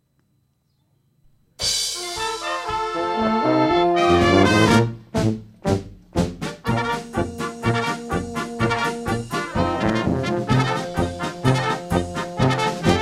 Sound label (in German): Trompeten Echo